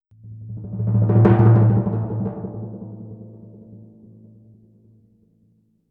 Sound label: Crescendo-decrescendo A, Timpani; PowerFX; Downtown Orchestra